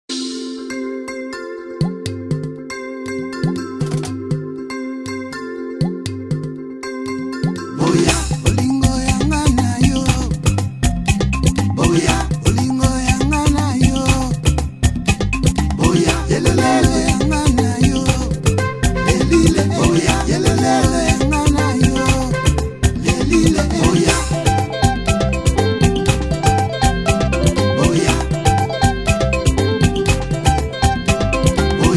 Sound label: world music africa; Sound PooL